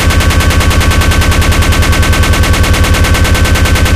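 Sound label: minigun shoot, weapons; tf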